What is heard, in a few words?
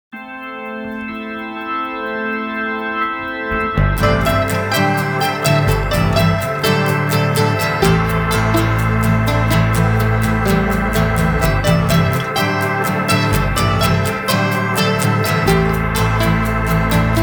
Mandolin Strum